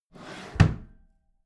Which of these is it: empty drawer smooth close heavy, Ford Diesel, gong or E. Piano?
empty drawer smooth close heavy